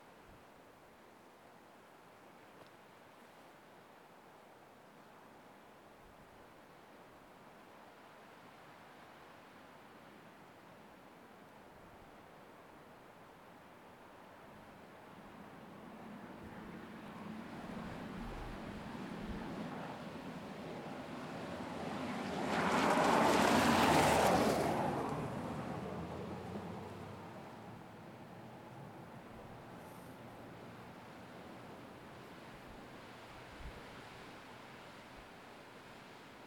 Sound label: Air Tone Constant Breeze Light Wind Car Pass Close Kicks Up Leaves